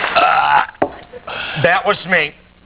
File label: belch